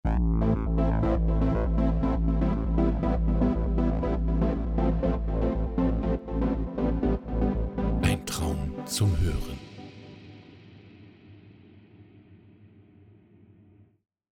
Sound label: Jingle Dream